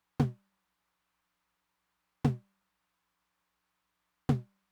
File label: Mid Tom, Roland TR-909; drum machine; Roland TR-909 Ableton Project